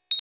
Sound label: Ping